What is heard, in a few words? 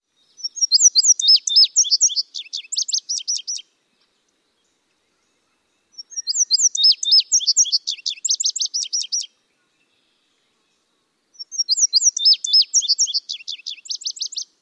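song, bird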